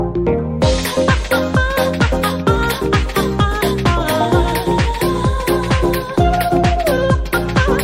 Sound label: weird song